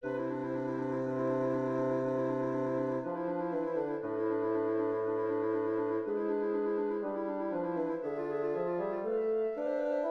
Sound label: fog